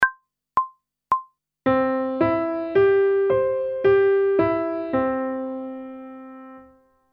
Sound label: Major Arpeggio one octave ascending and descending, pop vocals; grade 5; Technical Work